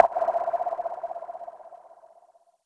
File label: flanger drop, flangered water drop sound